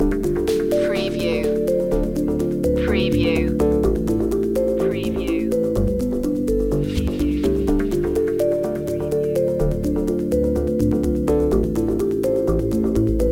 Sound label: beats loop, chill